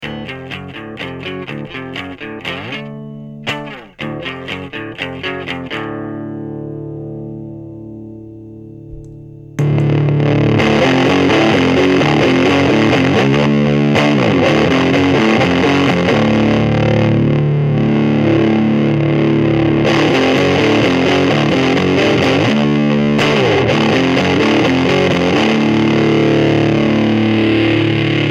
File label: ram drop d